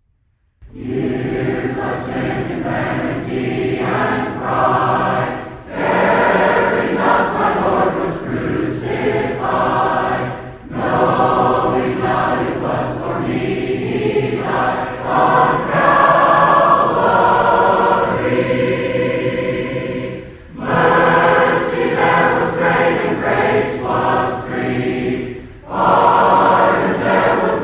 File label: a capella hymn; at calvary